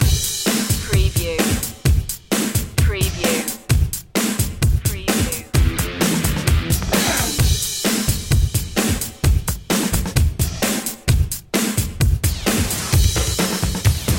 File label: rock box